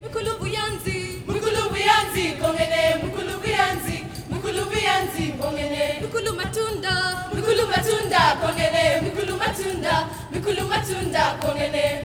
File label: AHO D3; Spectrasonics; Heart of Africa 2